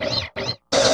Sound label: Machine Wash; Sound FX